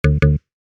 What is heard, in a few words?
computer error